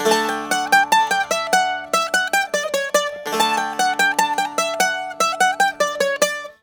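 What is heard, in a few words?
tzouras, D; TSOURA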